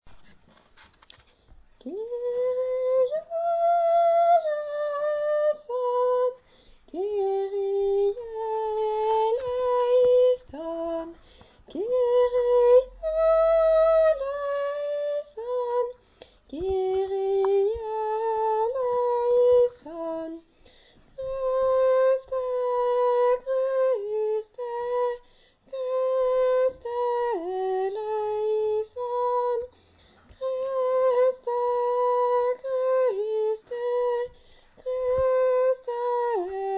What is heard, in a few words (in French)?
Voix de soprane kyrie; pro Europa